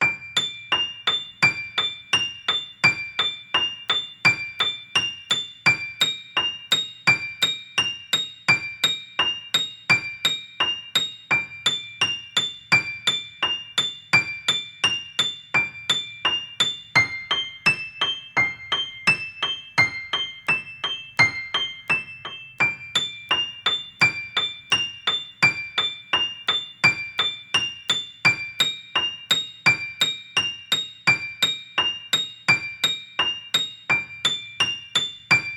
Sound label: Arpeggio; Wandersong Vol. 2